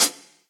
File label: Hihat Closed Shank Reso Classic; Drum Hits; Standard Hits; Ableton; Factory Packs; Session Drums Club